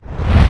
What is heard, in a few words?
fire, magic; The Elder Scrolls III - Morrowind; Morrowind GOTY GOG